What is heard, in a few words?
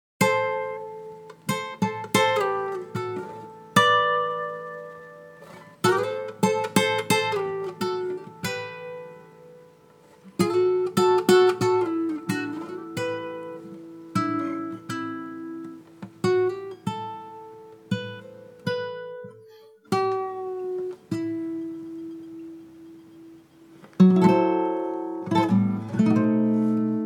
Plays Spanish Guitar